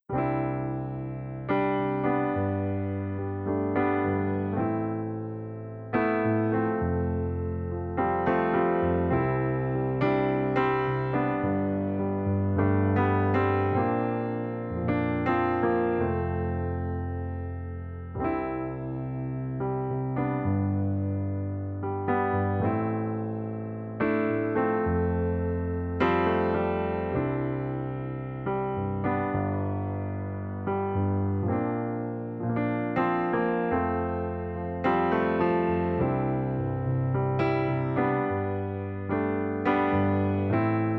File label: Yamaha C7 Digital